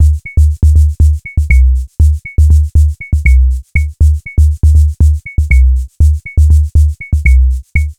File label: Bass